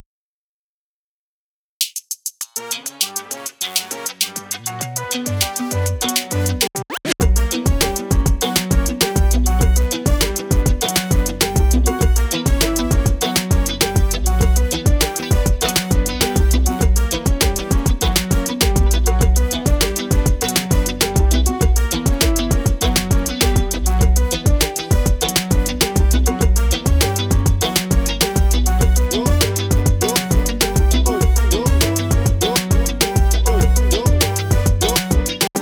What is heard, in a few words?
Latin Tropical Sunset